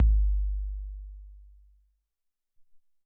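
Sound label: Tek Kick, Alesis SR-18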